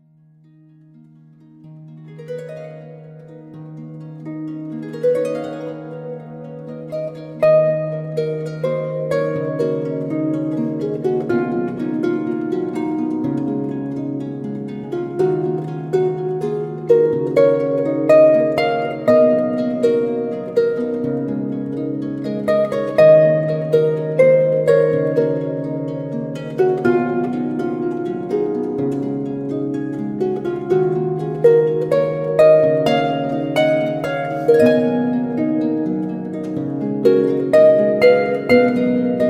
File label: Solo Night Harp